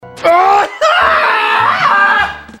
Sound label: aaahaaaa